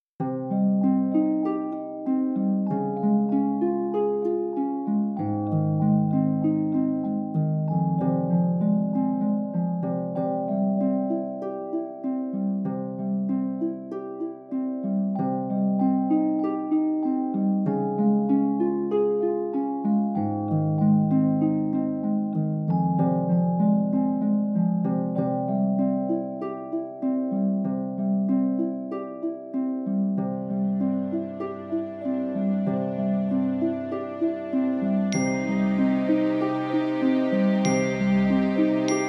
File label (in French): feerie nocturne en foret elfique; mysteres de la foret enchantee